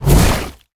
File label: Death Wall Interior Start; Evolve; Elder Kraken